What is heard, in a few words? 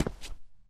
concrete, Footsteps; zps; Foley